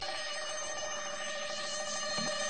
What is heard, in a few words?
theme